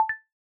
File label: Text Message Acknowledgement Thumbs Up, iOS Sounds